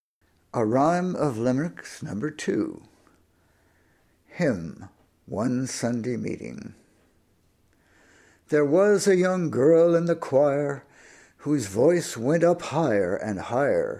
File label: Limericks Choir